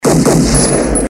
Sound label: shot seq